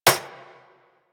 Bullet Impact Generic Metal; Surface

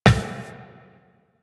Run Glass, Footsteps; Warframe; Tenno

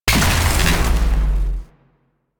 AoE Blast, Pistol; Weapons; Grineer; Amphis

